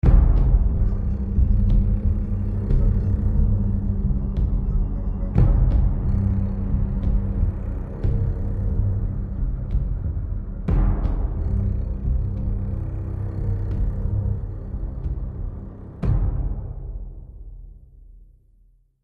Ominous; the walking dead season one

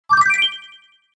Sound Bonus

